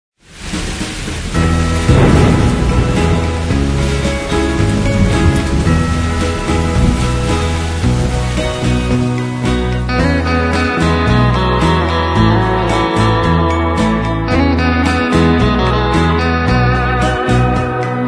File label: Guitar Instrumental; Instrumentais